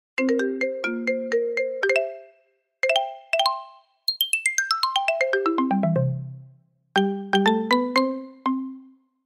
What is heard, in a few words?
Marimba